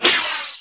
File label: ice missile; allods2d